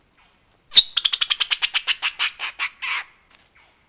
Mirza coquereli Kirindy

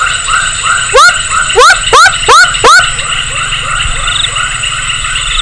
Pulchrana glandulosa

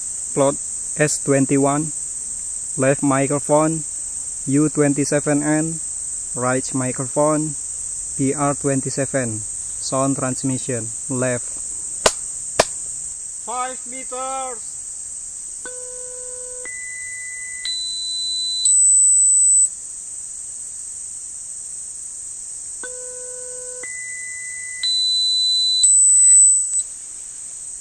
birds

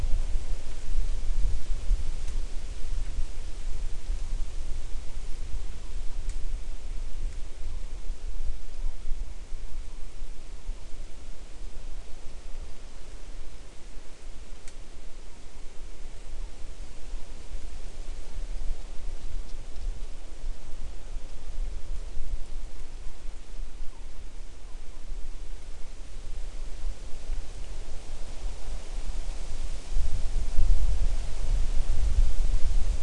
no obvious biophony

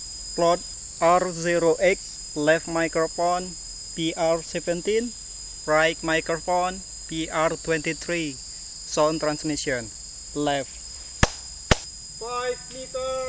birds

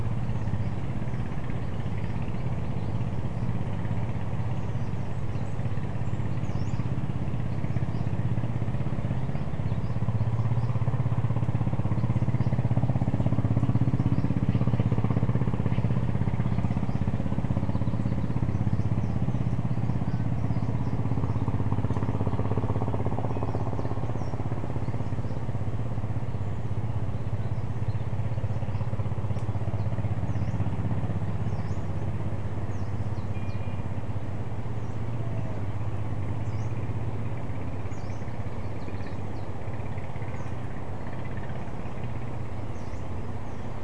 mixture of biophony anthrophony

